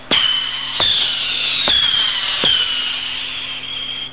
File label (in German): BOELLER; 999wavs